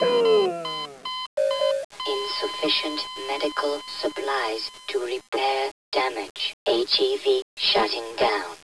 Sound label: death, player helmet; sven; bts rc